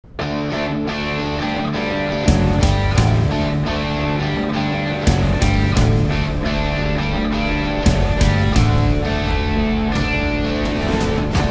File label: Intermission, Electric Guitar Version; Kaatru Veliyidai; Tamil